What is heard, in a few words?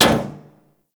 Ship Hit; RailJack Hit Effects